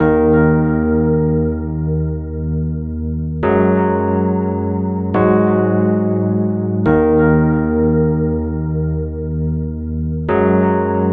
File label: Rhodes; KJ Sawka; Drum n Bass & Beyond